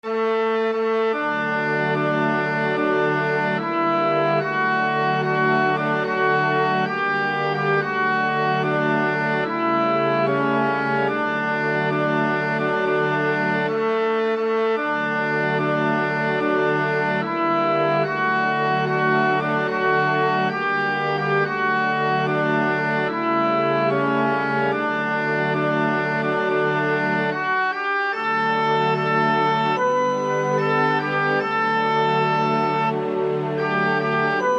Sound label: Anthem; Sedalian